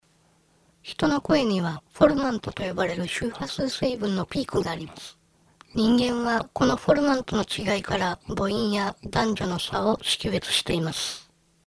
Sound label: formant test